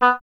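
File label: Wind OBOE B; Miroslav Vitous - Solo Instruments VOL-2; Solo lnst. II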